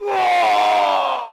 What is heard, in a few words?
"woah" martial arts oof